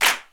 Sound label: hand clap c key; snare drum alternatives